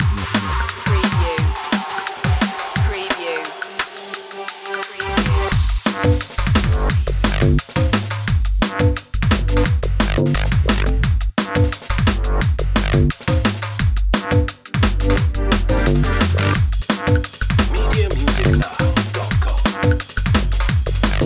danger dnb

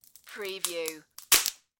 Wood Break Crack; Sound Effects Bible

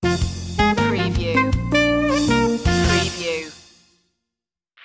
sting

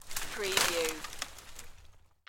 Wood Crack Break

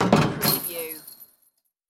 Basketball Chain Net; sound effects bible

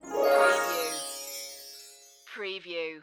Musical

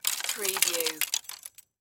Debris Glass Drop

